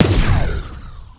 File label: blink; StarSiege